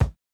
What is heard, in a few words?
kick, house